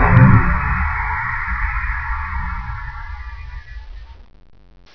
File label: laser; hurrican